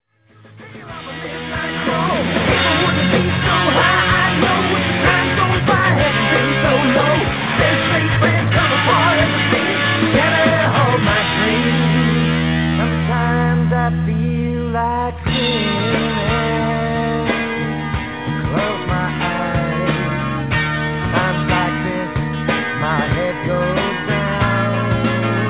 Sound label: screaming